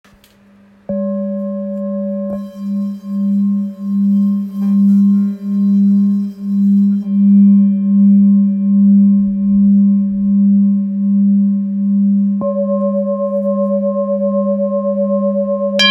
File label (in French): Bol ancien sol diese